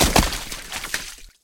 plyuhnutsya v ruchku s razbega

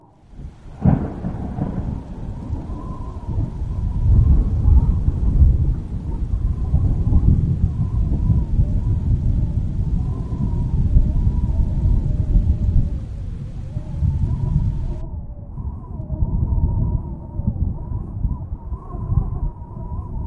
prodolzhitelnie raskati groma s usilivayushimsya vetrom

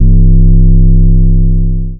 bur 808 bas

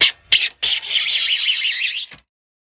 virezanie kuska akrilovogo lista; kotorij luchshe vsego ispolzovat dlya sozdaniya stroitelnih shumov

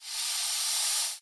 shipenie zmei pochuyavshej dobichu

